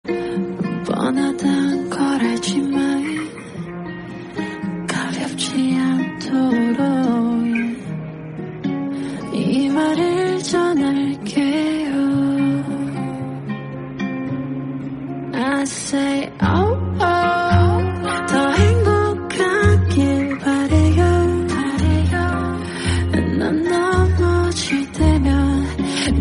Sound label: korean song